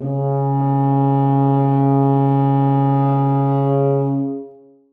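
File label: tuba sustain c#2; Sonatina Symphonic Orchestra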